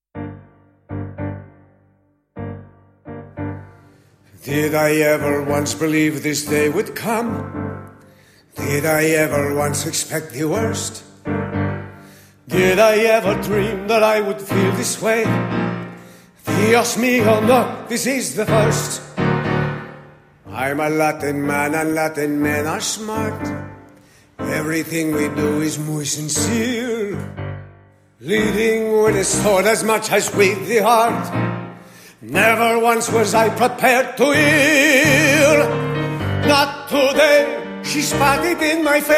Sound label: Male